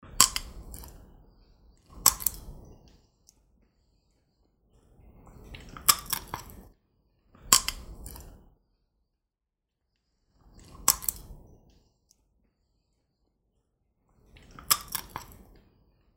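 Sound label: cracking sunflower seeds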